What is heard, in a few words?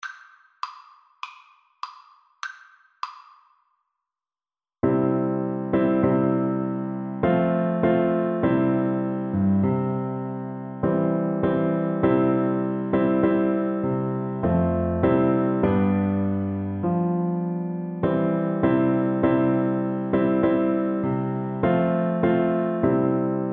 kumbayah FL kar, Flute